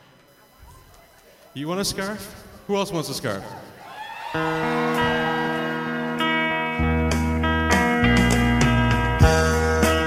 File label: Impersonator